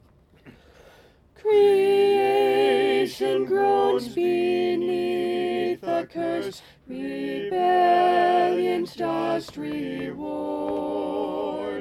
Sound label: Soprano